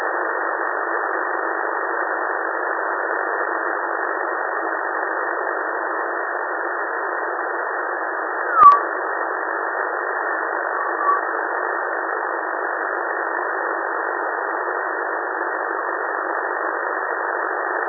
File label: meteor 143MHz